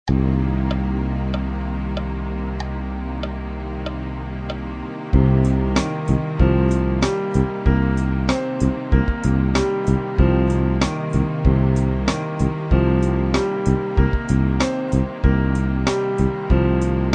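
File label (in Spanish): pentatónica menor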